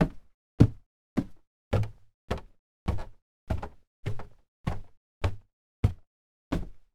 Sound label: climbing stairs in house